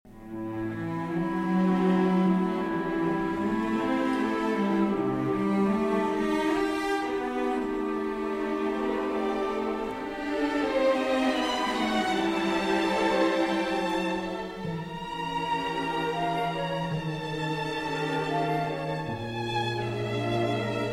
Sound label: Cello Concerto, Con Moto Tranquillo; Delius